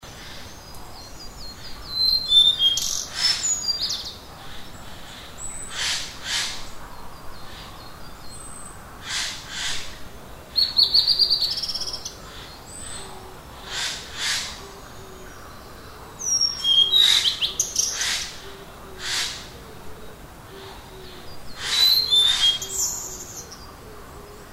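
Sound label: ooruri, blue-and-white flycatcher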